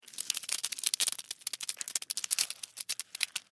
Alien Insect Spider, Scifi, Alien, Insect, Spider, Movement, Science, Fiction; Aliens